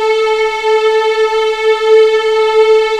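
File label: A4 LEGATO F, VIOLINS; East West; The Ultimate String Collection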